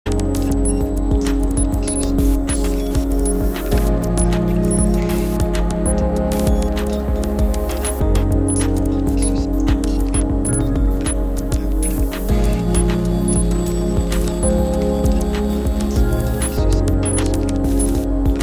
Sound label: tone